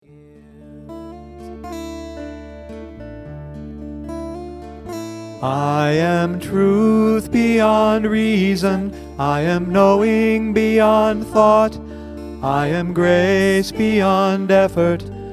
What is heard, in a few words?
A Prayer Beyond Baritone